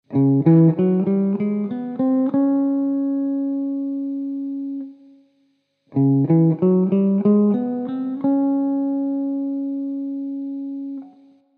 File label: Ultex Jazz III regular tip pick vs Primetone Jazz III speed beveled pick